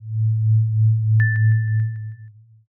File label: final tension